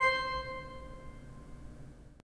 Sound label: pipe organ, 3-D Audio